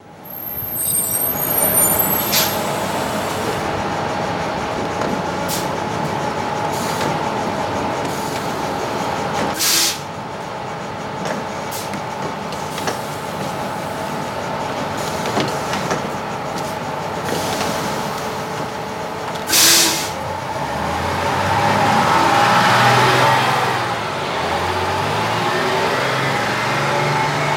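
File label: City Bus Stop Idle Out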